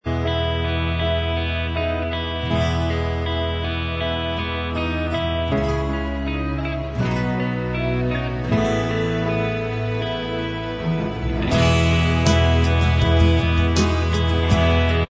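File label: instrumental, backing track; Hannah Montana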